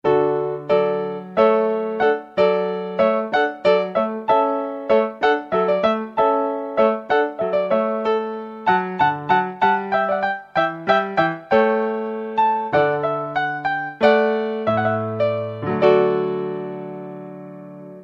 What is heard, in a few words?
hornpipe, piano